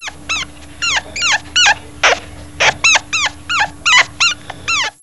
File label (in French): agouti cri